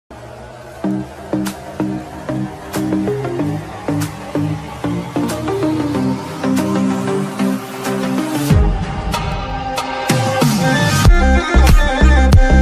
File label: ringtone attitude ringtone bgm ringtone english ringtone